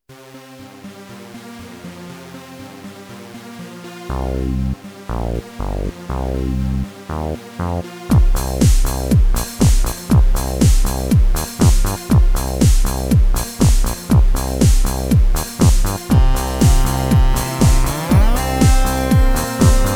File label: techno